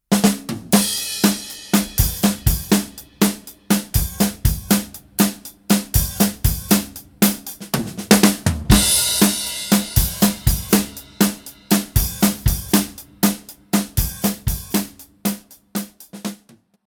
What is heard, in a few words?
Motown